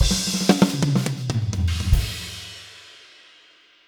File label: Drum Fill